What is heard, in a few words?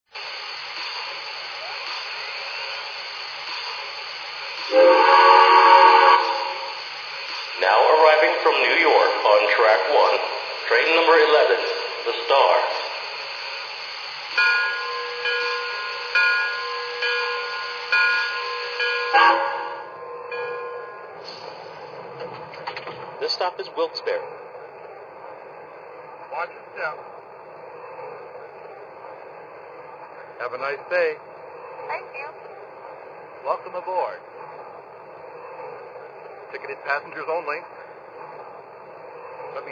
hudson; lv